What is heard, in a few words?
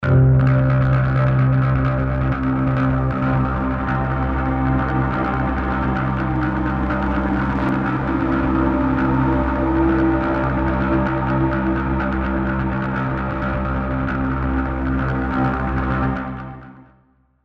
Acoustic Guitars Pads; Scoring